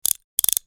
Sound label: Handcuffs